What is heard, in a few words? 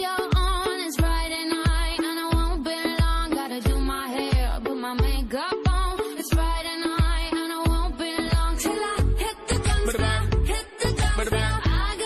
english best status ringtone